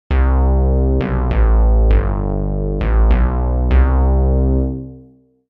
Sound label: Plasma-Bass rev piece